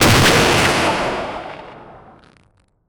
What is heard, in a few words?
grenade launcher